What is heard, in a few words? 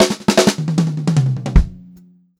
FILL, Pop-Rock Drumloops; USB Soundscan vol.37; AKAI; 90 s Sample CDs; 152 SPEED